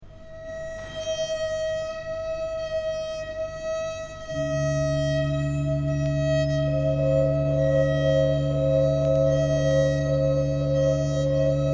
musica nova contemplativa

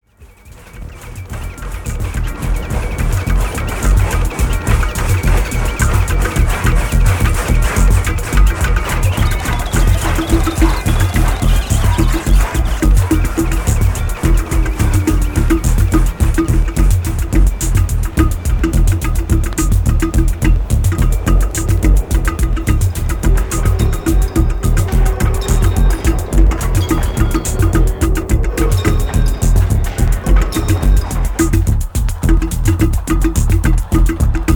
rhythm and noise

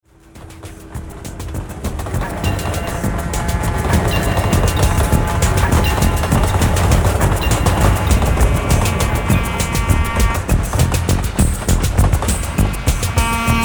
rhythm and noise

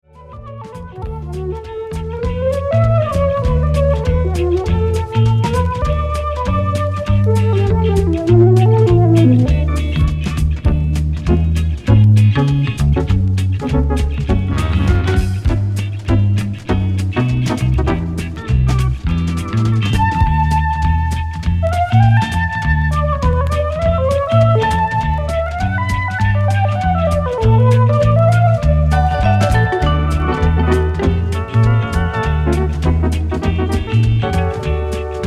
orchestra

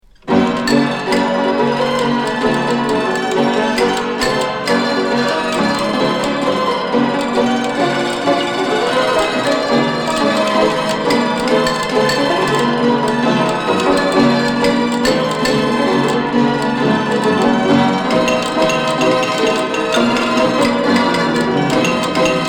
mechanical musical

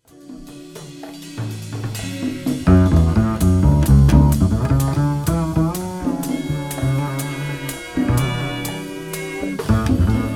rava string band

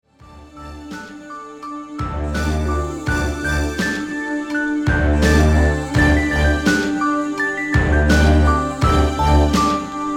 ambient

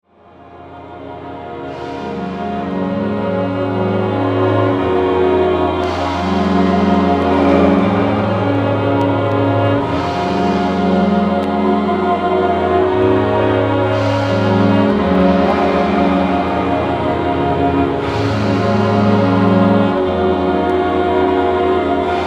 urban sax

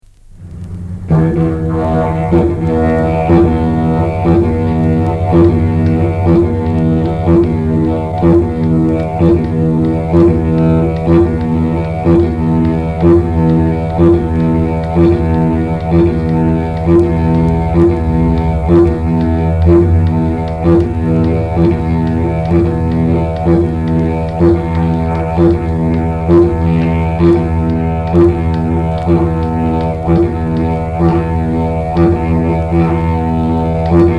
didjeridu

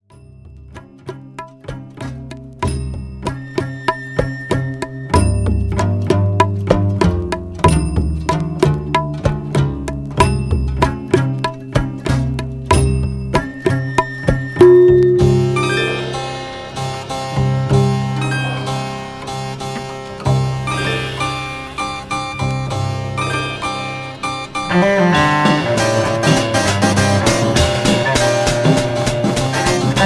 baroque jazz trio

